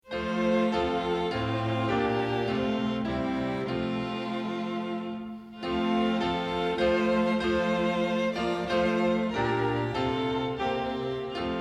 glory to god on high vocal and instrumental, hymns